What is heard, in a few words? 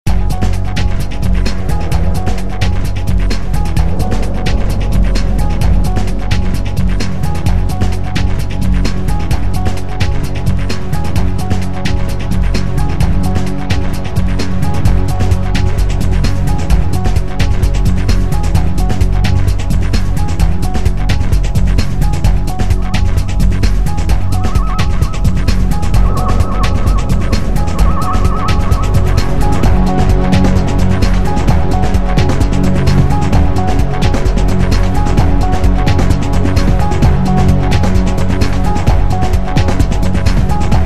music anticipation motiv; Ioncross Total War